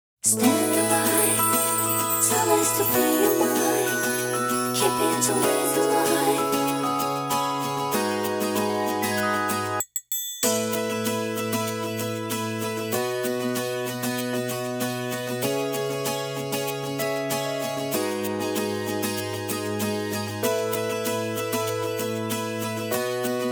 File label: Instrumental; Yorinuki Gintama-san